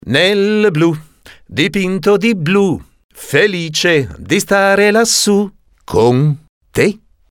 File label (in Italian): canto